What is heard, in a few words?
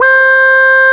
QUAC, QUACK SOLO, Synth